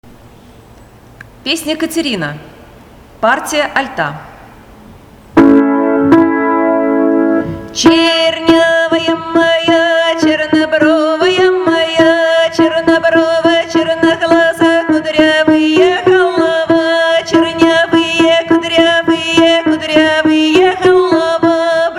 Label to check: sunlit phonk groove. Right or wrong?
Wrong